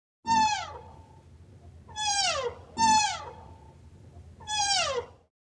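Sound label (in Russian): олененок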